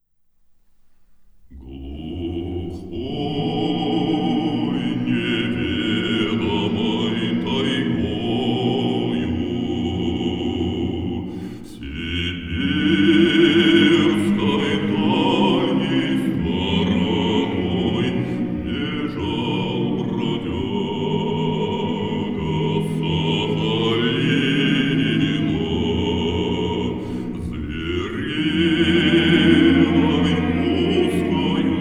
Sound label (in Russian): Хор